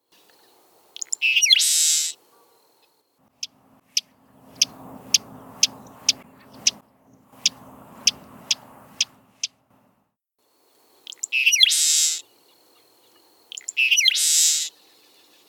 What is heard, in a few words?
Seaside Sparrow